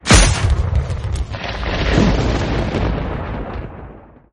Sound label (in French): foudre